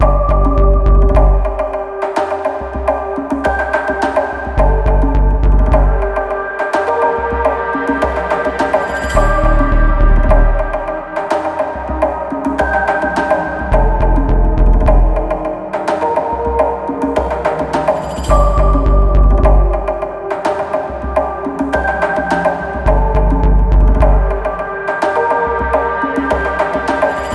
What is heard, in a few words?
Mystery Loop; Video Game